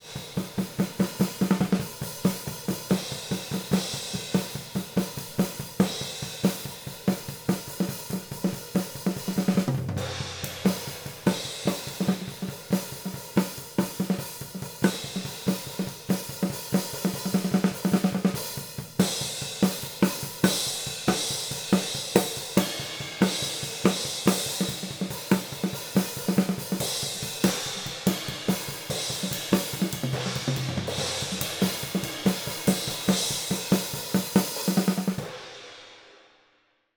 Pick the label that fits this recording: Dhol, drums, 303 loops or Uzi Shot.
drums